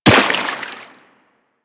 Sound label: Run Water, Footsteps; Warframe; Operator